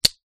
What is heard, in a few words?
industrial metal crocodile clip release; zapsplat